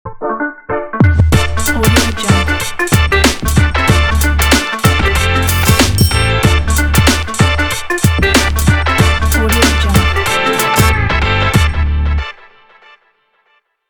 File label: hiphop intro logo; khursey